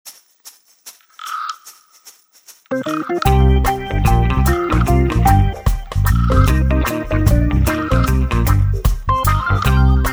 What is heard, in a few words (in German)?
Hammond Reggae